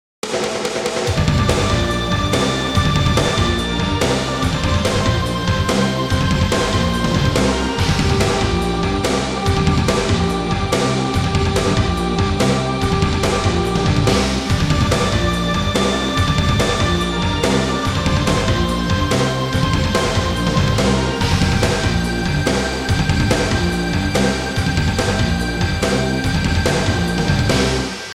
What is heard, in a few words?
Intense Guitar